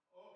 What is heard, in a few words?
Drums Verb